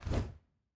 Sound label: environment mob release all